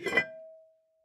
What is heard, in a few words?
large vessel unseal; TFC Quick Pockets